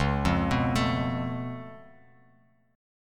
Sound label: Db Augmented 7th Db down Bass Standard; arpeggiated